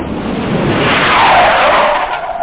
Jet fire

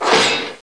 ARR METL; Renaissance

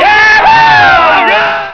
Got Comet; Maelstrom 1.3

